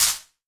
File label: Wider Clap